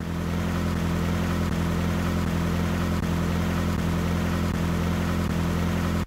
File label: Motorbike; RedDot